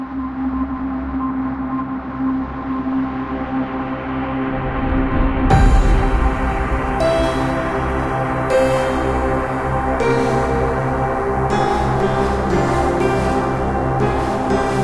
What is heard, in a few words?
mech death loop